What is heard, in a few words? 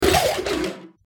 wiggle; fake insects